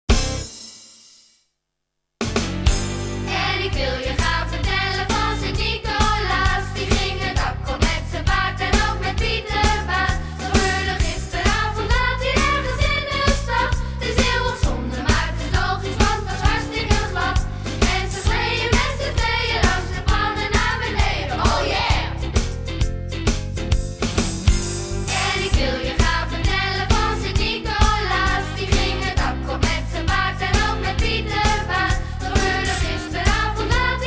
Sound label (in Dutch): Sinterklaasblues